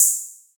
wet shaker hi hat a key, Royality free shaker sound tuned to the A note. Loudest frequency: 8226Hz; percussion